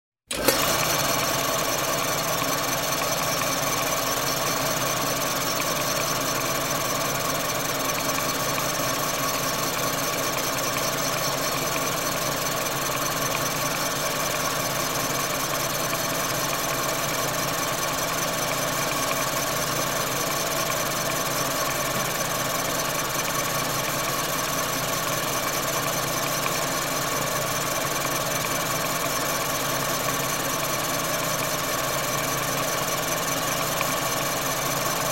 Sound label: traffic